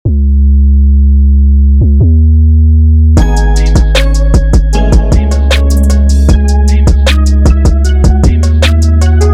Clean

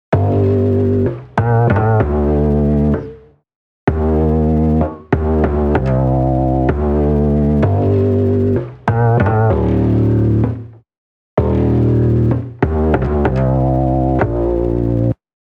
Bass 808s Lo Fi After

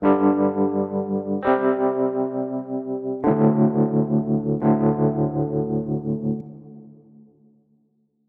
Chords Full Rock; midi wizard 2; Unison

